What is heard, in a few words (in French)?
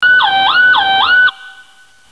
sirenne